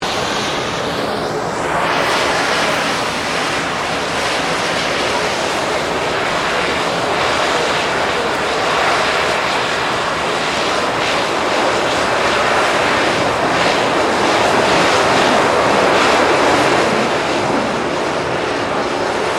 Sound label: AIRCRAFT C-27J SPARTAN IDLE SHUTDOWN, Spartan Transport aircraft; Airplanes